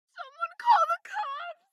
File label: town human road; Blind Drive